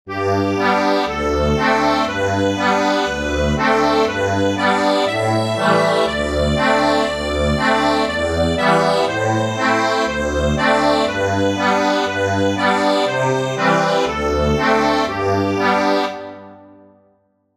accordion, sheet music

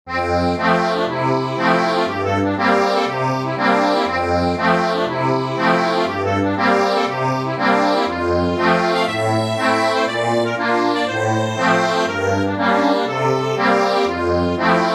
accordion

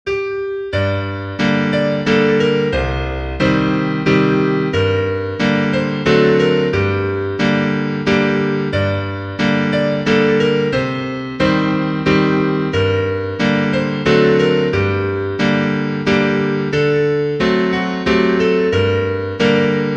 lead

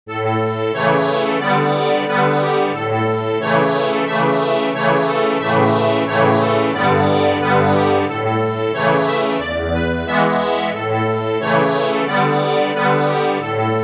Accordion

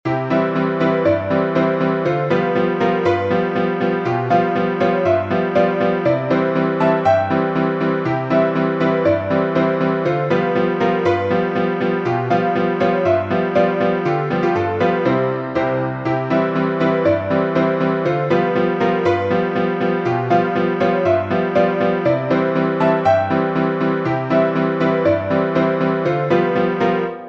piano, sheet music

